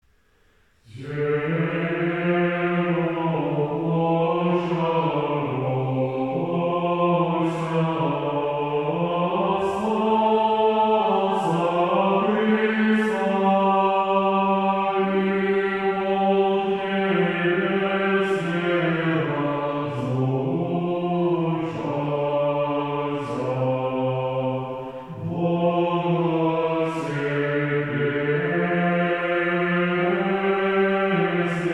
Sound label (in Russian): Стиховна 5 глас; Тебе Воплощьшагося